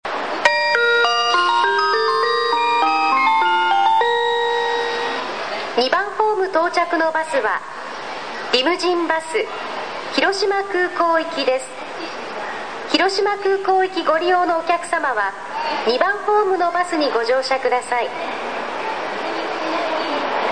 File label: bus center; ２番ホーム案内放送; 広島バスセンター; 広島そごう３階にあるバスターミナルで、高速バスだけではなく一般路線バスも発着します。; 出発ホームでは主に高速バス到着時に自動放送による案内放送が流れ、冒頭にはのりば毎に異なるメロディーがあります。